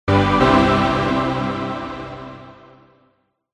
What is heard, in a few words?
achievement; captain backwater